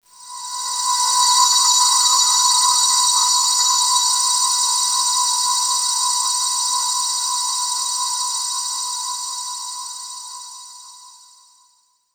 Crazy Diamond Pad, Underground UK House & Electro, Pads; Sonic Foundry (Sony Creative Software)